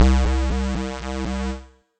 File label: Bass; Field Marshall; Ableton; Skitter and Step